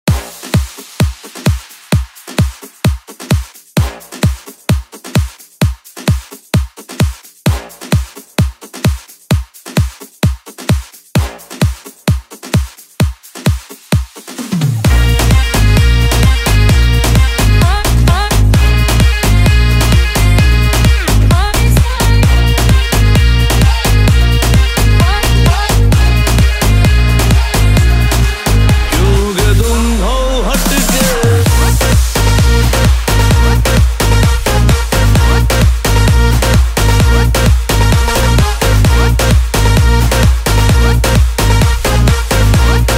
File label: Remix